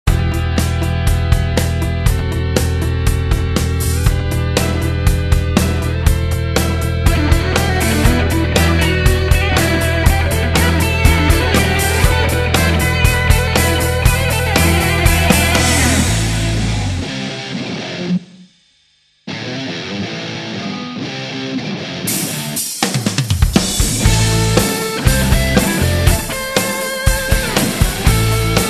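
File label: guitar instrumental; Rock for Children; Charity Projekt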